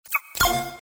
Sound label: Widget Entry; DailyBonus